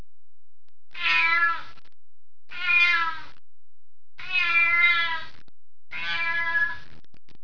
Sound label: meow